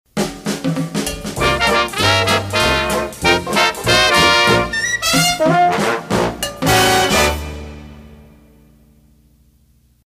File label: Dixieland